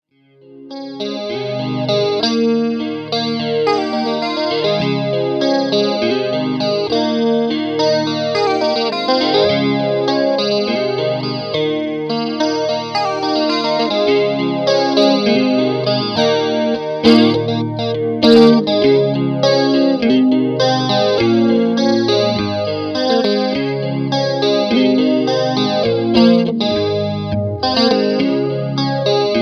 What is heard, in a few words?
chorus